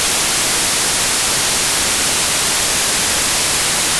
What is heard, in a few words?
Noise